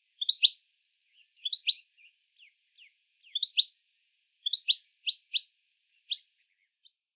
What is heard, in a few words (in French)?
chardonneret elegant